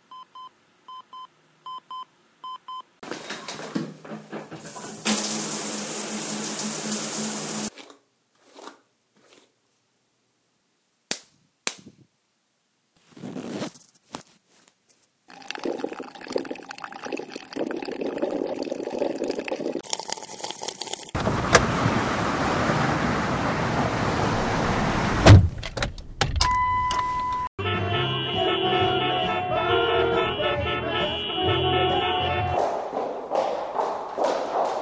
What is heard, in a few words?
Soundscape; CDM Funke